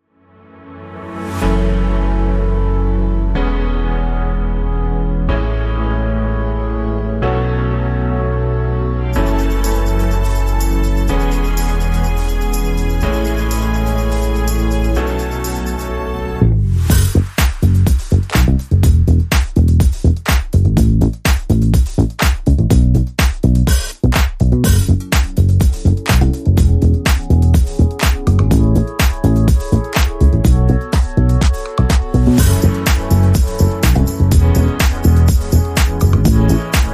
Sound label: Electric Disco, Rhythm